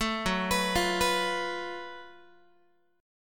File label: F sharp Minor Major 11th, Guitar; arpeggiated; 12,14,14,15,15,x